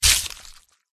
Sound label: flower attack contact up